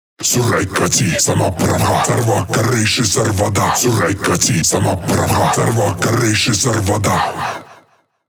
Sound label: 138 Shamanic Voice (Wet), Psytrance